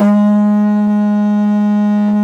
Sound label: AF2 HORN L, Didgeridoo and Other Primitive Instruments; Big Fish Audio; DIDGEHORN1